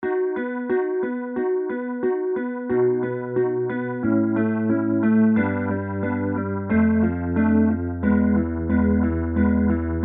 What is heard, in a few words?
Pianet Rotary Speaker